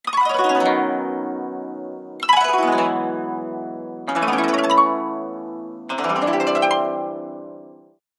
Koto Glissando Ascending Descending Set; ShamiKoto; Syntheway

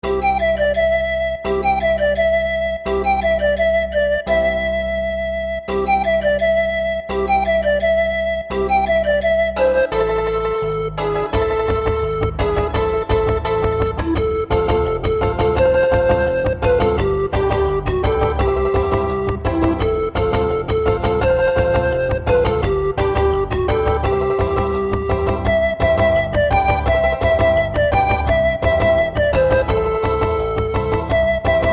Andean Quena; Flute; DAL Flute; Banjodoline; Syntheway

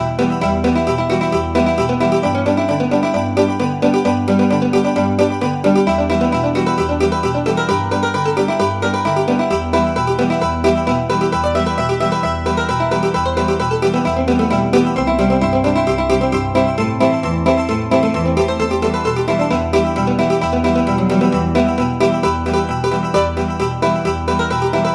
Archtop Mandolin; Banjodoline; A Cowboy Legend

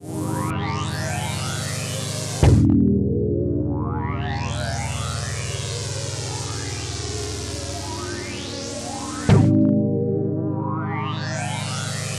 LEAD Unearthly Translucence; Synthelium

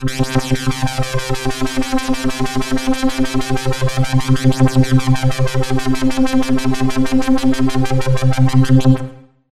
PAD Rhythmic; Phasewaver; Entrance; syntheway

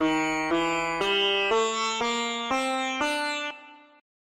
D-Sharp Marva Thaat (India) Scale Virtual Sitar; Syntheway

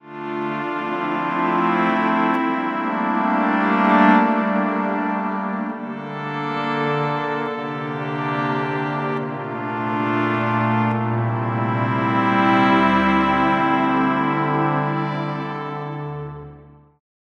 Pad; Astra Turbo Quasar; Andromeda

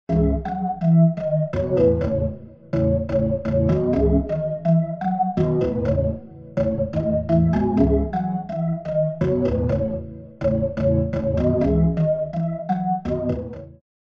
Rotary Speaker Spread Wide Cab Organ Wet; AmpVibe; Syntheway

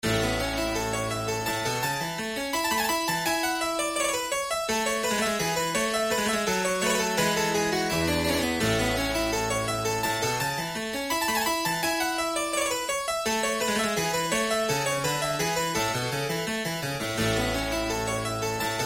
Suite in A minor, Clavecin; La Triomphante; Nouvelles Suites de Pieces de Clavecin; Jean-Philippe Rameau

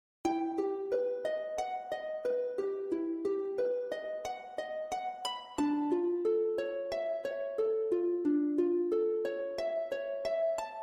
Virtual Harp; Final Fantasy 8; Chordophonet; Syntheway

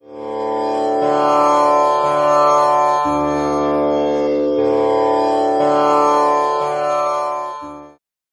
Virtual Tanpura Drone D, Virtual Sitar; Syntheway

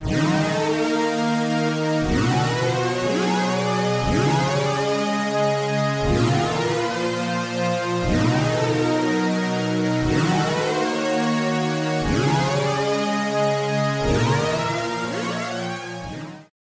ATMOS Distant Galaxy; Synthelium

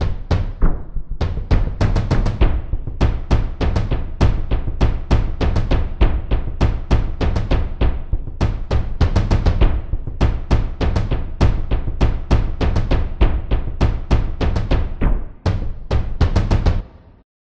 Japanese Taiko Drum; Drumwavy; Syntheway

